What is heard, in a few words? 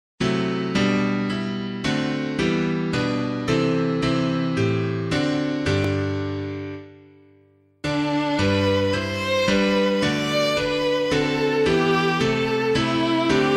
The Day You Gave Us Lord Is Ended, piano